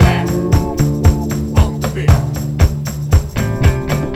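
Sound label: TRIBAL, 116 bpm; USB Soundscan vol.32 - Roots Disco; AKAI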